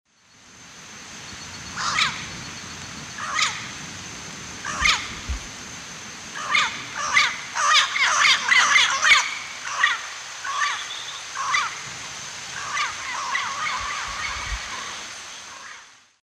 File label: Amazona ochrocephala, flight calls; S. America; Eastern Lowlands